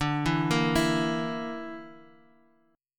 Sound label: D Suspended 2nd Sharp 5th D Guitar Half Step Down